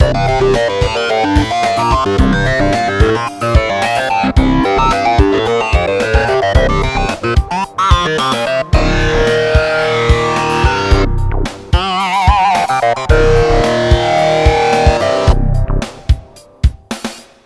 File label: roaring memo and mm bass